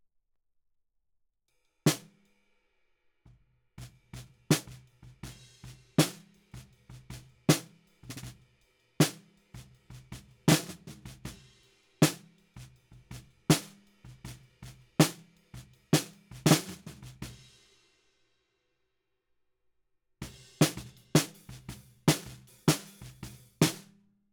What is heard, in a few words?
Snare Bottom, 160 BPM